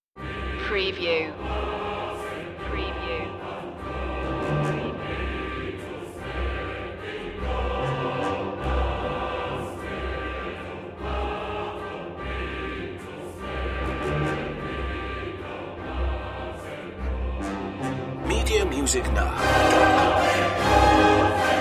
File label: no perc